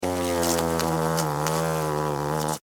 Hornet Hover, Hornet, Hover, Close, Fly, Wings, Buzz, Insect, Bug; Sound Effects:Animals:Insects